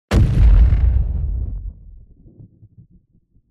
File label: Explosion